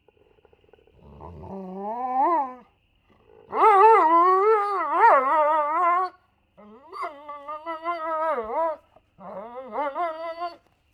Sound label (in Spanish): PERROS ladrido grunido respiracion EXTERIOR NOCHE